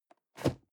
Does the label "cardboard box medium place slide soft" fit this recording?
Yes